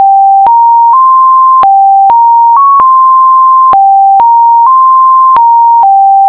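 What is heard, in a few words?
2 times frequency; ECE301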